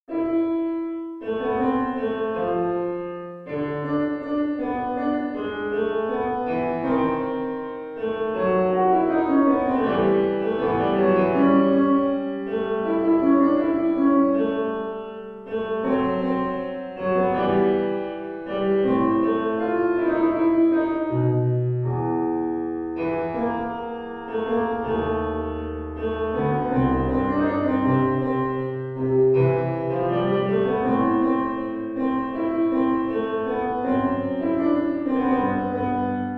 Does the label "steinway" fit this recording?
Yes